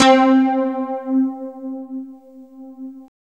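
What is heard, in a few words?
synthesizer, digital; Sound & Vision - Gigapack I CD 2 (Roland)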